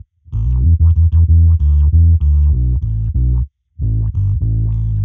Bass FX 95bpm E; musicradar; dub designer

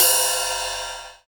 Linn Drum; 90 s Sample CDs; Sound & Vision - Gigapack I CD 1 (Roland)

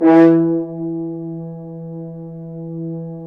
BRS, FHns Accent; Roland L-CD702; Accent-Swell

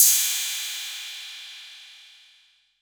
PERC 808

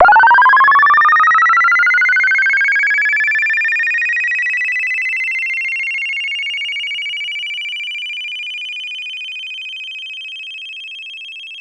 Pollard Syndrum

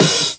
SD, Dance Drums

